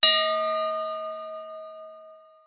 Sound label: Vote Display Proposal; Pax Romana